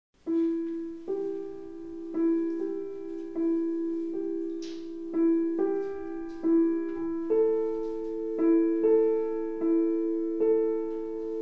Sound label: Piano Recital Wiegenlied, Pianist live; Liszt